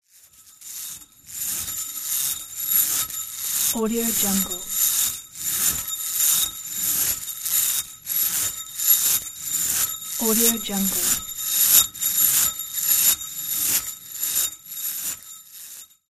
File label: play metal spring